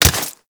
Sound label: Staff Grab Idle; Warframe